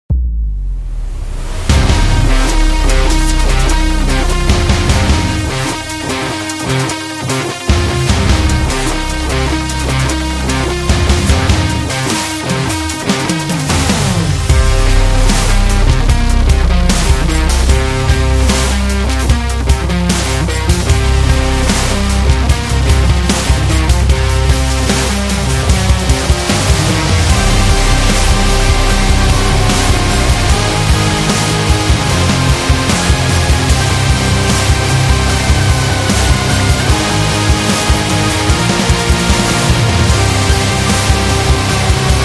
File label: Rock On, metal